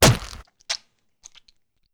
sword impact through flesh; Weapons & Explosions; Sony Pictures Sound Effects Series

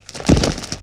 Body Fall On Dirt, Hand To Hand Combat

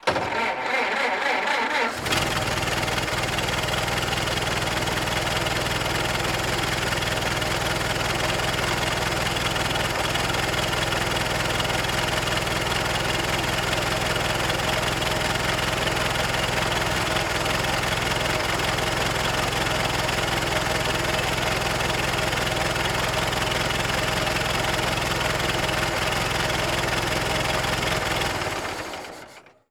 Antique Car Start Idle Off; Sony Pictures Sound Effects Series

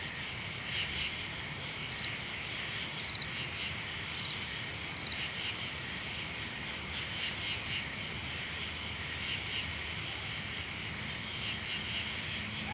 bird fore; RYL

